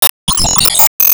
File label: Team Skill Tap